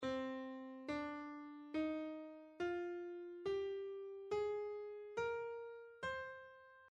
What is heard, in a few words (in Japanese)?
cマイナー 自然短音階